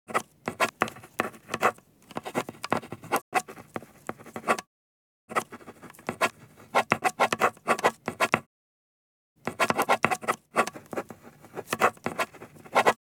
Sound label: Pen writing on paper